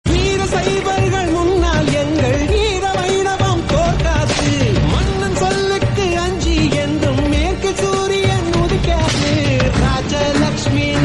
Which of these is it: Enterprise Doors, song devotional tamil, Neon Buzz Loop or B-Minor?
song devotional tamil